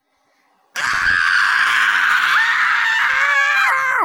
ahhhhahahhh

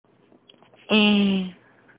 ehhhhhhhhhhhhhhhhhhhh

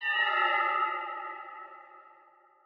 ghost cry

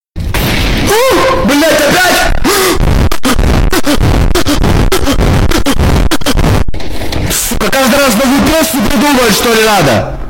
phonk; csgo

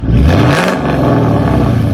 reving

